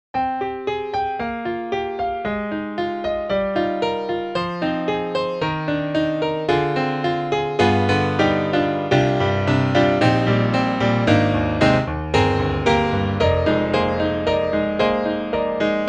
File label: Classic GrandPiano Bright; Hispasonic; Hispasonic Sampled Series